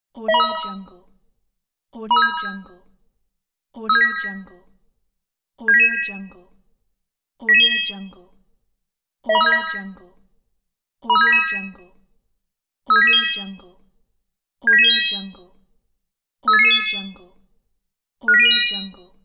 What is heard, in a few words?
match three winning chords